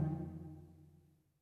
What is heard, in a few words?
Loop Tail; Good Job